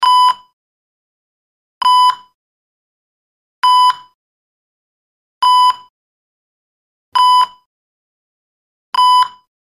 Heart Monitor; Steady 1; EKG Heart Monitor; Regular Repeating Medium Pitched Beep, Close Perspective. Hospital.